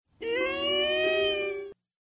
hiiiiiiiiiiiiiiii

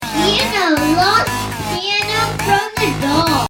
piano; lost

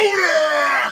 punch

